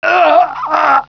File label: pain; Grounch